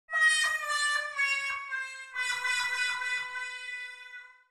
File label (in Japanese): ホワンホワンホワンほわぁ～ん, 効果音; ジングル